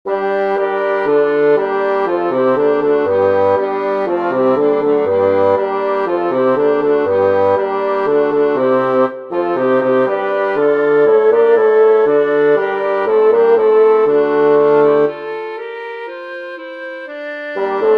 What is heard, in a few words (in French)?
Basse; Un jour vis un foulon; Concert du 13 décembre 2024 en l'Église St Pierre - St Paul